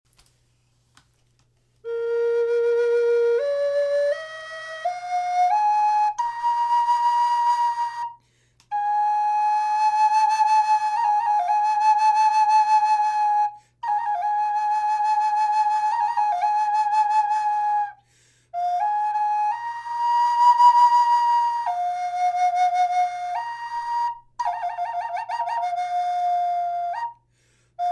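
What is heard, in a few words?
natural, wood flute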